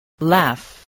laugh

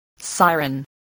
siren

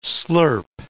slurp

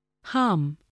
hum

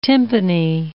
timpani

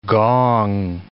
gong

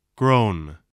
groan

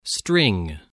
string

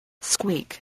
squeak

